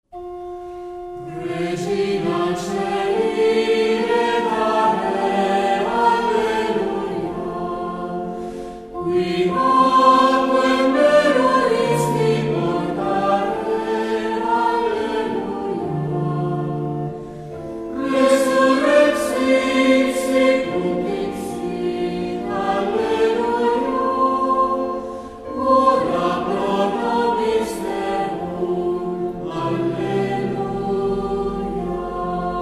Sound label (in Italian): Regina Caeli Antifona alla Madonna canto finale; Tempo di Pasqua